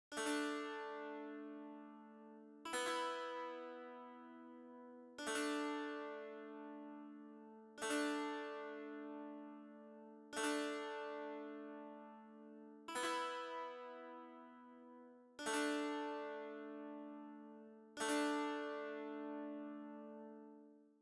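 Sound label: Dulcimer Loop Marimba Theme; Factory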